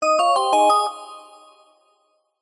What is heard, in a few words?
multimedia alert notification musical soft chimes positive complete